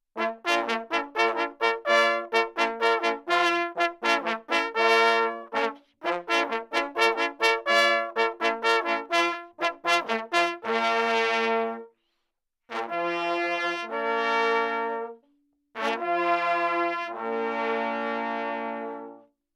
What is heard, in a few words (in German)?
Auerhahn Tot; Totsignale - Hochwild